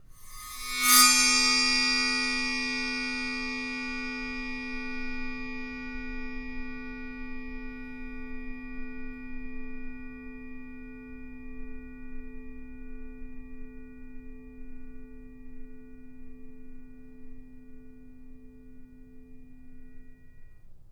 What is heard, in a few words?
Void Perc; The Void; Processed Drumkits